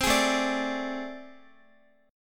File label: A Minor Major 11th, down, Guitar; AmM11 strummed; JGuitar